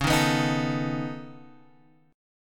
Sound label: C sharp 7th Suspended 2nd Sharp 5th C sharp down Guitar Open G